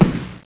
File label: crack; Blasteroids 3D